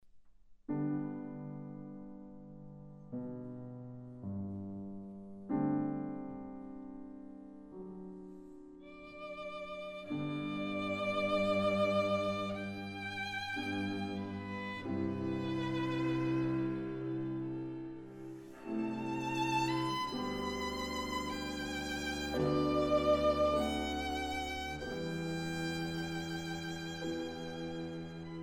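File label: piano trio; franck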